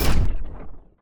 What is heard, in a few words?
mech step; Mindustry